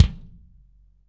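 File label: BD DRY, bass drum; Best Service; Real Mega Drums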